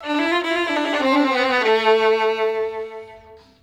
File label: World Lick